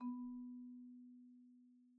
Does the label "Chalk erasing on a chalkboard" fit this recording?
No